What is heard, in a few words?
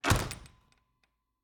Cabin, front door, split door, oak, large, iron fittings, close, hyper, soft distortion; Eiravaein Works; Vaeyan IV